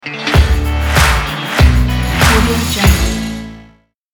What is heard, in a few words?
opener logo